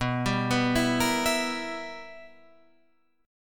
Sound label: B Major 7th Suspended 4th Sharp 5th Guitar Open F, chord; BM7sus4#5; arpeggiated